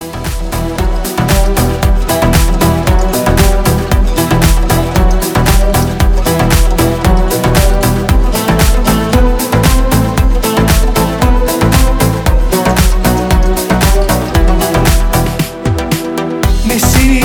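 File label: ringtone Turkish sadness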